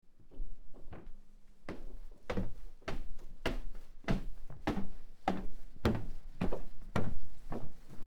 Stair Footsteps; horror; horror sfx vol 2

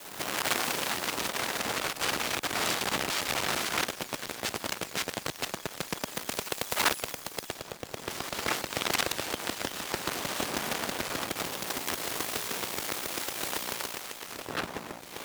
Electromagnetic Macbook Sequence; Mono; Essentials Series NOX SOUND

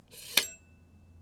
Small Knife - Unsheath, kitchen knives; Micro Pack

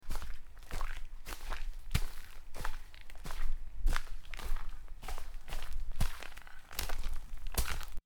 Mud Footsteps; horror sfx vol 2

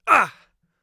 hit, Mage Voice Pack

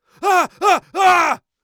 on fire, Warrior Voice; Warrior Voice Pack

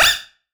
Creature small; Free SFX for anything

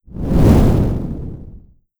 explosion; NIIIEMAND's explosion sfx

